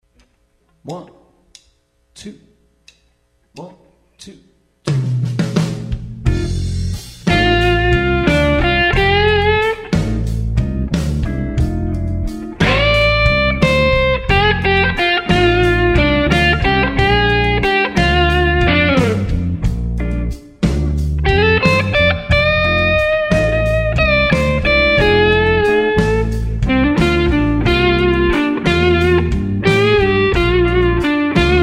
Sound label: Solo Slow Speed, blues; EBS